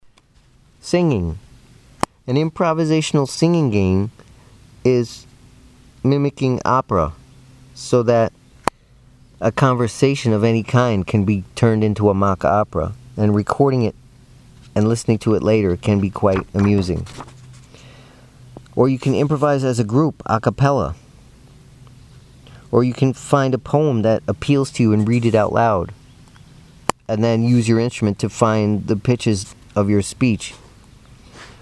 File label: improvisation singing